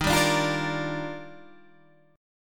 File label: Eb Major 9th, Guitar Half Step Down